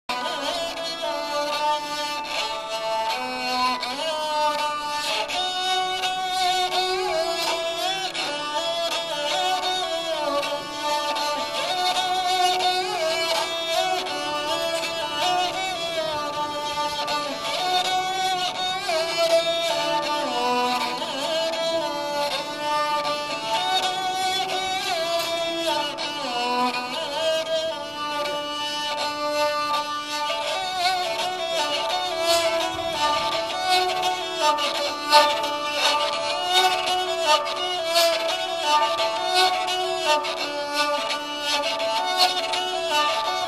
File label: sarangi